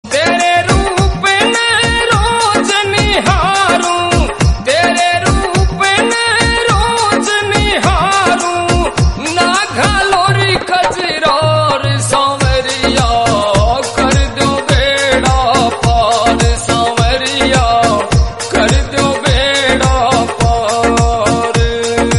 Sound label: Hindi Song